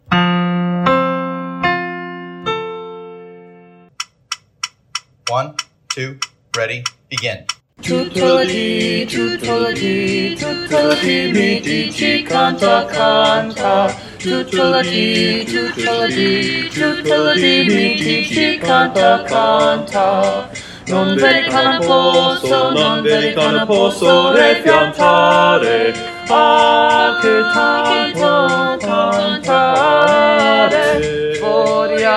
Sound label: soprano; tutto lo di